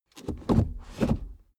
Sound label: suv change reverse gear slow; verkehr transport; autos